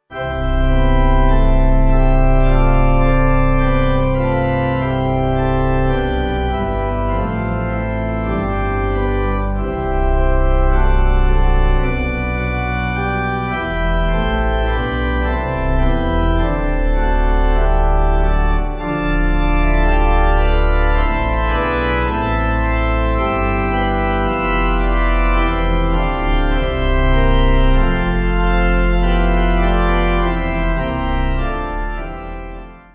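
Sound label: Pipe Organ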